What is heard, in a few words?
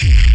Syn Dr, Overloaded Synthetic Drums; style kits